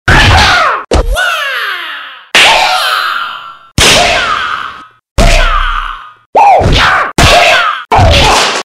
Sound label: Kung Fu; حرکات کونگ فو